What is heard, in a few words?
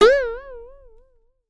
comedy spring twang pluck; sound effects one